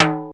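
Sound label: TR727 timbale high; 90 sSampleCDs; Crypton - DrumBase5000; 505+707+727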